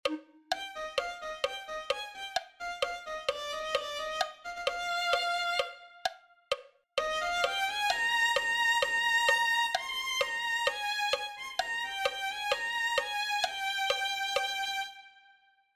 violin

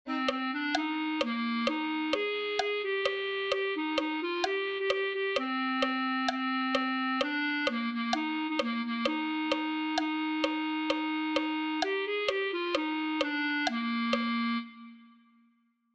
mi corazon se fortalece en ti clarinete

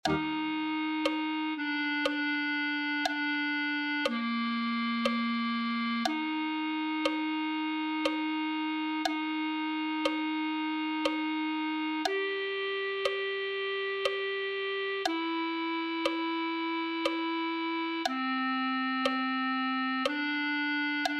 oh que te conozca clarinete

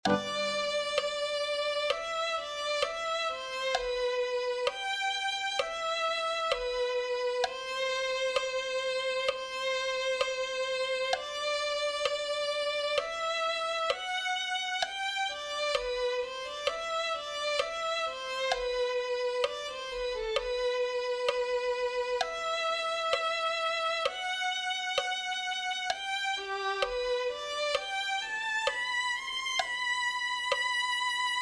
no pases de largo cristo violin

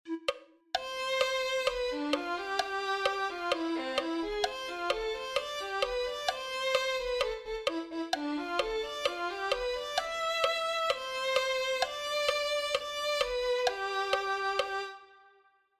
en el señor me gloriare violin

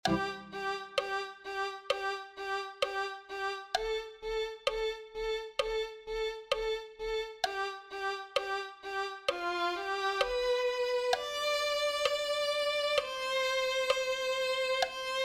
violin

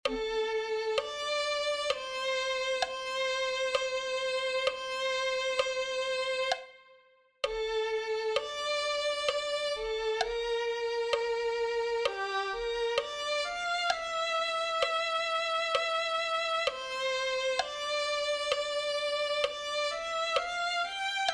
cristo es muy hermoso violin

